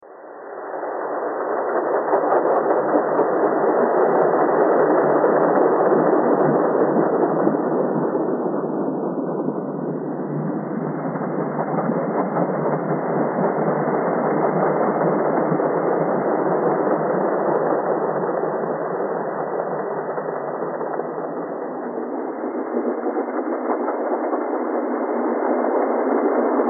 thread buzz

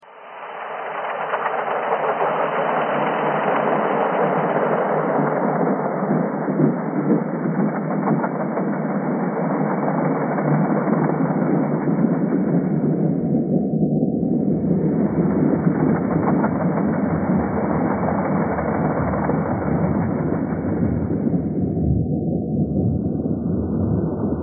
train thread all

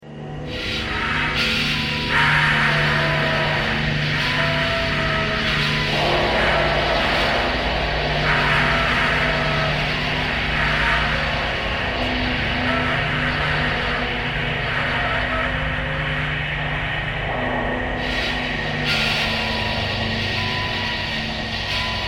thread simple

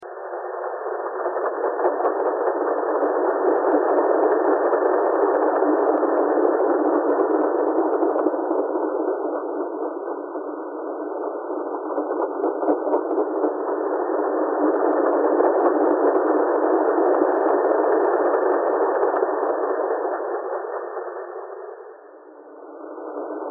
thread buzz

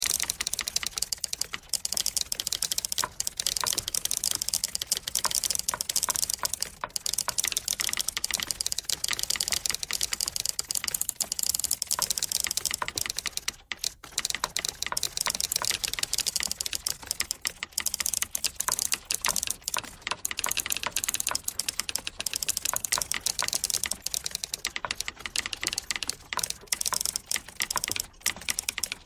typewriter thread all

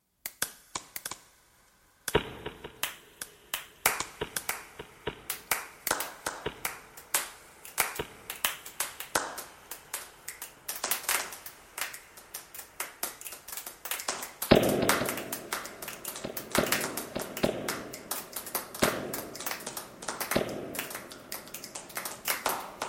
clapping thread noise

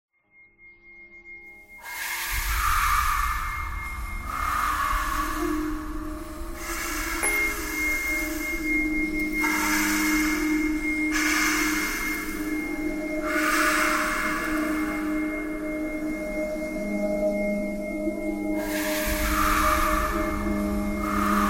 breathing thread noise

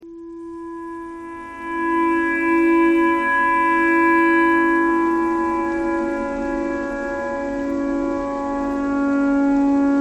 tornado siren clarinet thread noise

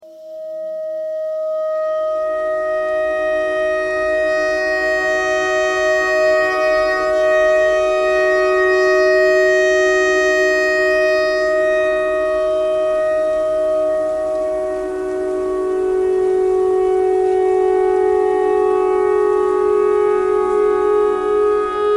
tornado siren clarinet notes e thread simple

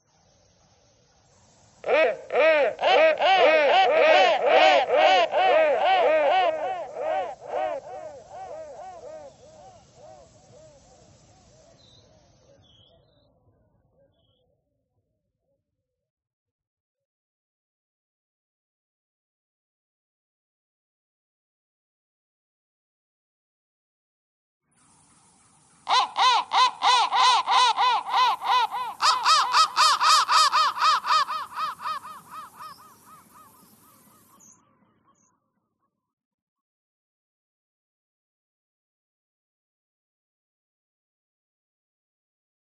crow thread noise

